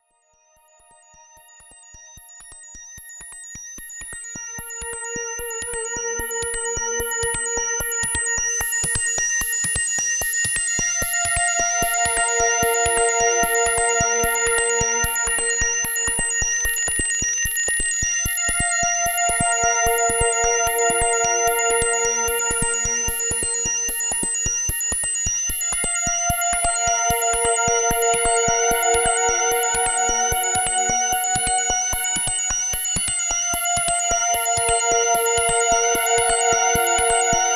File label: JD800 mix